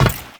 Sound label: step metal, footsteps; Warframe; Teshin